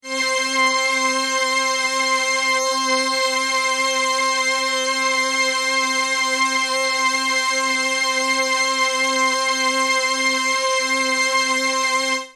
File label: stage phaser